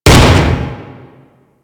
Contained Glass Hit

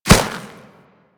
Kubrow Step Snow, Footsteps; Enemies; Warframe

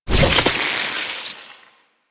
Infested Fishing Spear Throw; Warframe

